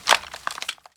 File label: suit, footsteps